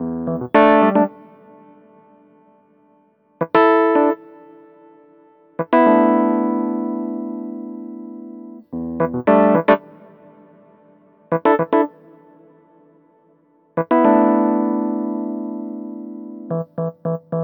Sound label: 110 NuJazz _Emaj, E-Piano; Pro Tools Essential Loops; Digidesign